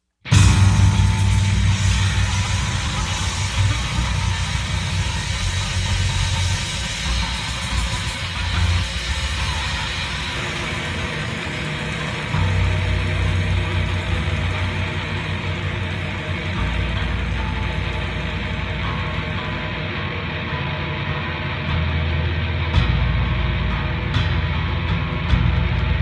Noise; Knitting Factory Works

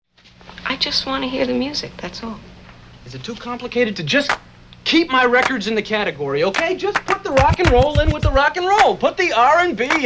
Jazz

